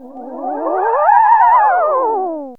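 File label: SYN-FX, synth; E-Lab; X Static Goldmine 1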